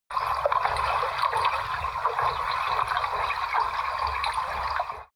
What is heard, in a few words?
canal